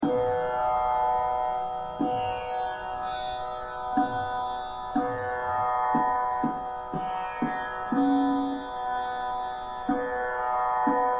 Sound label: Bada Khyal, Ektaal Vilambit; Nut Bhairav; Gun Kaise Gaun